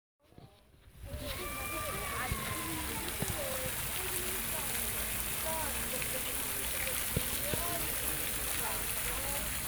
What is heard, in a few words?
fountain